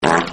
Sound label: fart censor